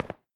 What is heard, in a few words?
glass footstep; adams venture origins